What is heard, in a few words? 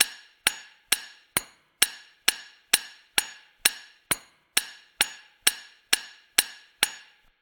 manual repair simple; factorio 2.0.55